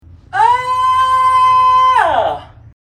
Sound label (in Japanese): ベルティング 見本